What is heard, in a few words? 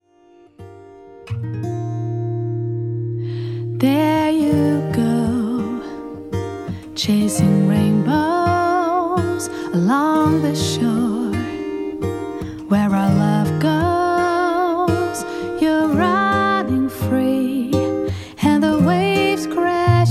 acoustic